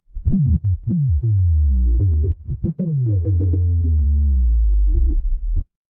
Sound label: mechanical machine